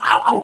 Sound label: ballbot hot laser